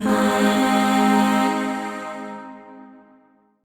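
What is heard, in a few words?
Void Shawzin Minor Chord; Warframe; Zariman; Chords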